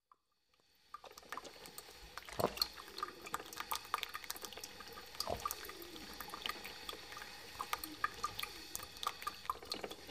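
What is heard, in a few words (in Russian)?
фильтр кофемолки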